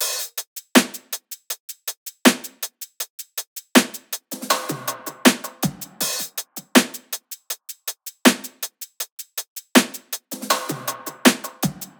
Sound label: top loop 80; Pop; Drums; Loops; Slate; SD PASADENA Pop Sample Pack